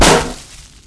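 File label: grass; Mafie 1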